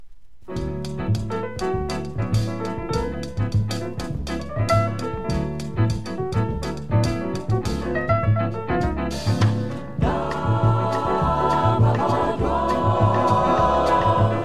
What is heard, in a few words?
vocal jazz